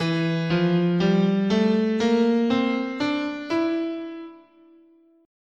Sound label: E Locrian, scale